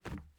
plastic jump

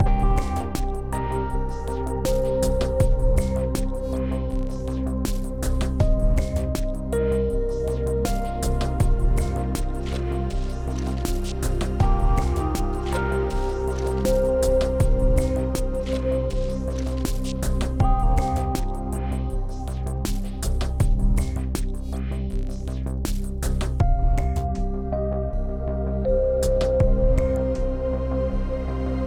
Exploration 8CH 80BPM; Downtown Act3

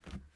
plastic jump; teardown; ps5 windows xbox series xs

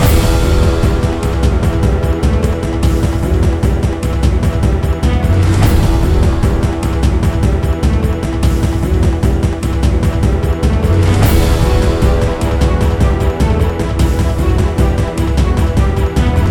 BGM PVE; Kurtzpel

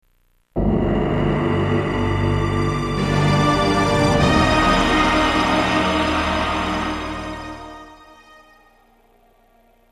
river windmill cutscene; Indiana Jones and the Infernal Machine

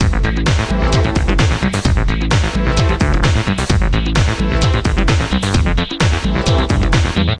Techno; Learn to Fly 2

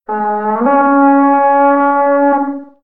jackpot; DW How to Train Your Dragon

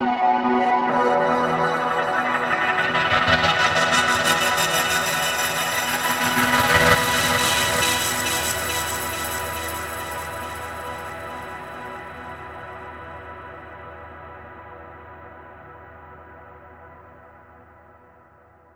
Overlay Tone; Act2 Anchor

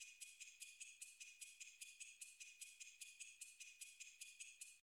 Stopwatch; Bureaucracy

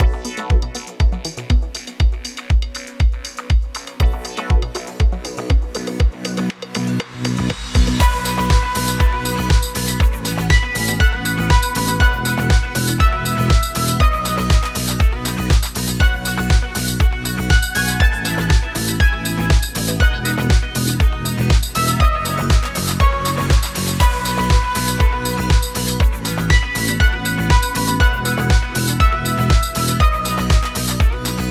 bgm matchmaking; disney melee mania ios macos gamerip 2021

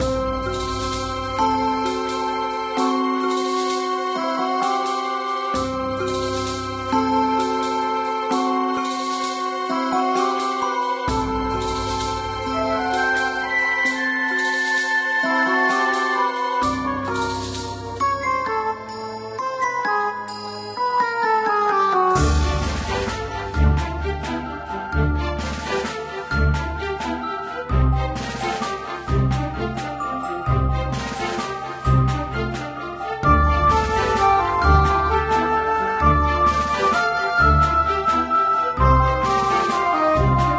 Dungeon; Sephirothic Stories; Android

